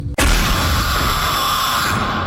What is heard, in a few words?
scream; fnaf 6